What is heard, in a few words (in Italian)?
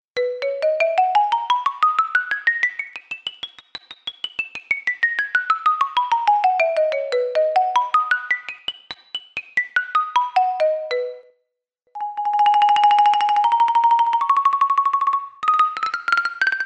xilofono